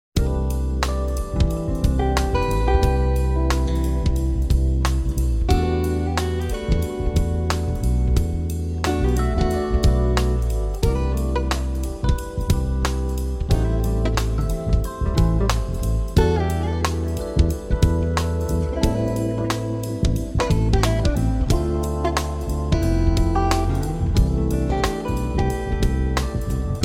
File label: instrumental